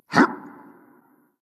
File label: hitreact human male small